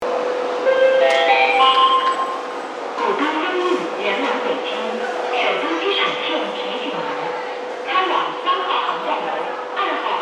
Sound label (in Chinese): 进站; 首都机场线首都机场方向